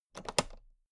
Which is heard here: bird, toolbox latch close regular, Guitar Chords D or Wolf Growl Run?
toolbox latch close regular